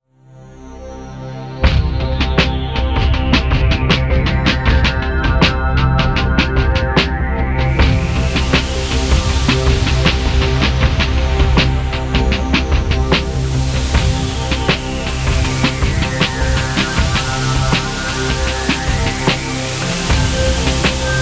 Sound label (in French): Interlude